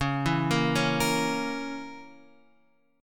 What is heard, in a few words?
C sharp Minor 6th; C#m6 Chord {9 7 8 6 X 6} arpeggiated